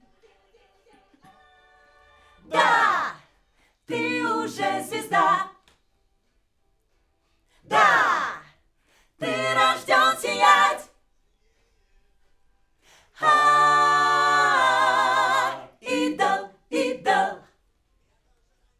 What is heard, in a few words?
Backs AKG